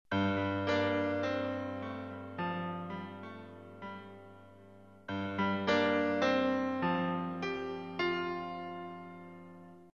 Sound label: piano